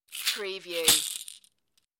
Caxixi

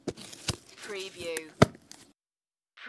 Plastic cup, open lid

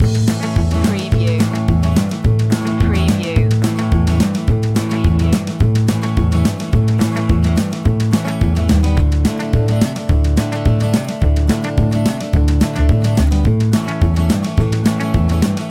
on the range loop

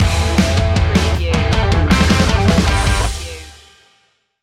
play it loud stinger

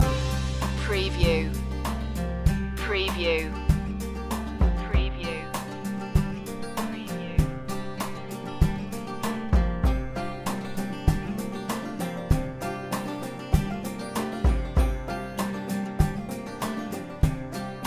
folk loop

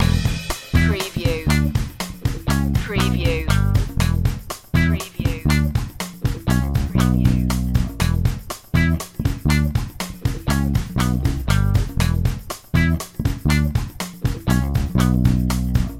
funky strut loop

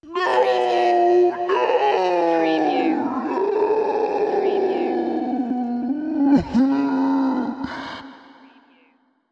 Ghostly Sob

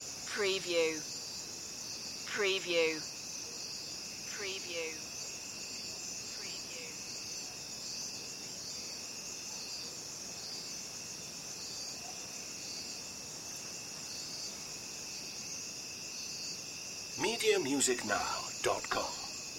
night crickets

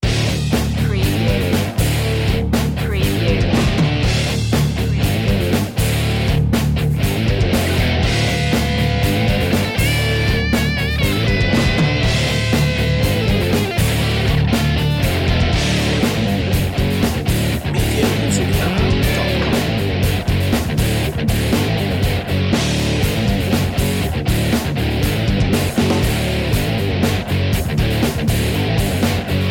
metal

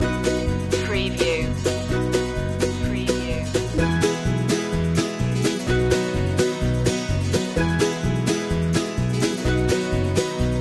cowboy hat loop

